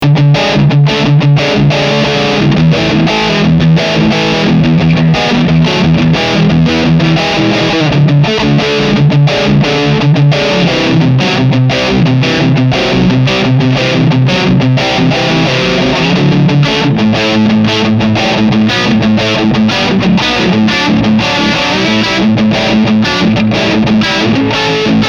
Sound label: maximum gain riff